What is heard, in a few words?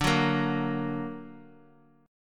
D Augmented D down; D+ strummed; JGuitar